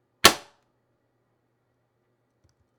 Snap Trap

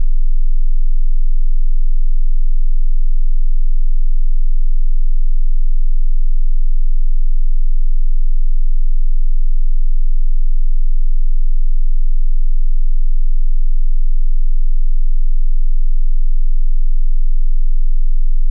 Infrasound 20hz Sine Wave

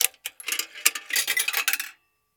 Coin In Jukebox Slot Fall

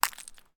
Impact Ice Small

